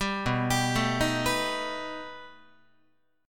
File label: B Augmented 7th B down Guitar; B+7; arpeggiated; 10,7,10,7,7,10; 4A,4E,5A,5D,5G#,6C#; JGuitar